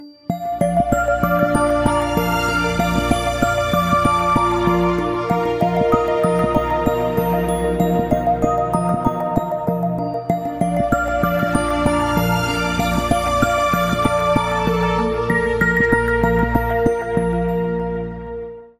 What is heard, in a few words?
Oppo A79, ringtone